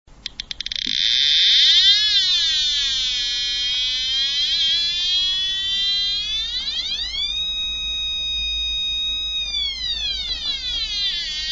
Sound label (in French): Detecteur Sonore De Lumiere